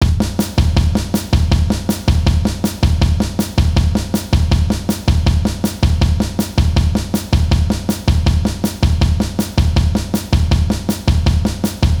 Samba moulin

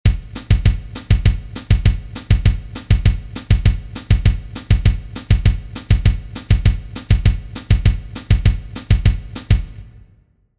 pied 100 bpm

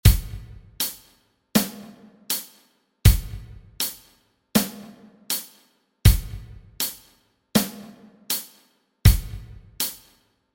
illusions rythmiques accent toutes les 3 double croche 60 bpm

